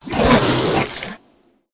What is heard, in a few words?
werewolf attack voice mid